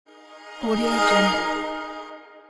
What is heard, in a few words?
magic whoosh